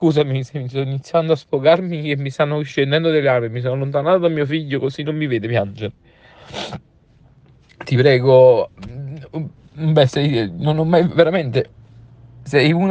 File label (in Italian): Pianto